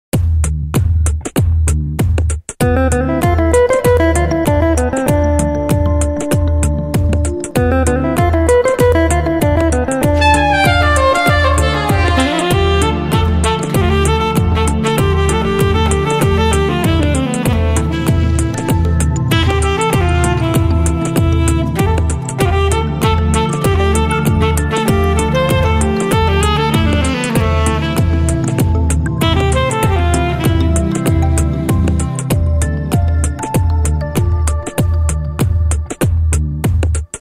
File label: saxophone bgm; ghajini